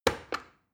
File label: plastic button start click heavy slow